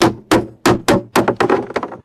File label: hollow plastic pole drops and bounces